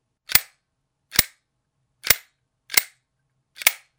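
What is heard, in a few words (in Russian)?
звук щелчка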